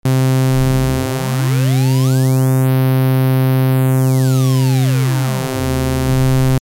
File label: notch reso, synthesizer basics